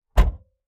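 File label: sedan trunk slam; trunks & hoods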